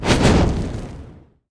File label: fs Hit Heavy Volcano Add; wild worlds